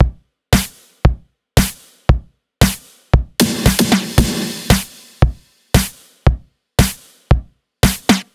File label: 115 Beat; Zero-G - Future Wave